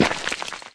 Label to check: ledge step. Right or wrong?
Right